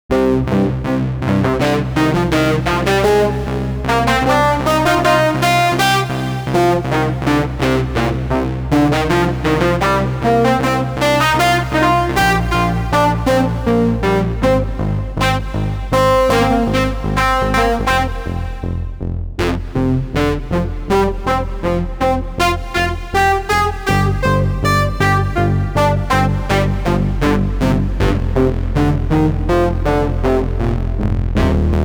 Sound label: saw and sine seq; the digital life